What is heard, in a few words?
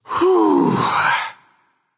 nv sigh; smite; voicepack; mercury